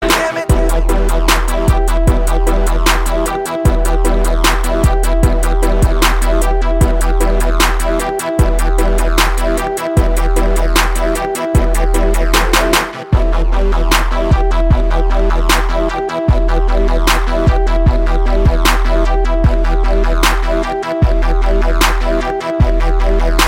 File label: music